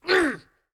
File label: SFX House Hit Vo; android; Portal Quest